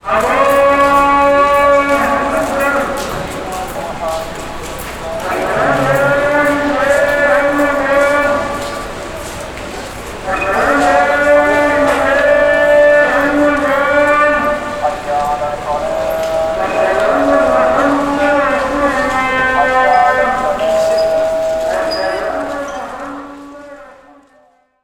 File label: AMBIENCE, Arabian Traditions; USB Soundscan vol.43; AKAI